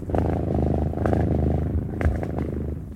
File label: kitty purr; minecraft; technic